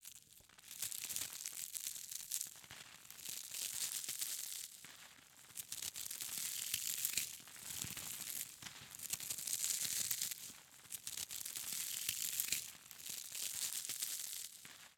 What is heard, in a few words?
Crunchy Footsteps Biting Burning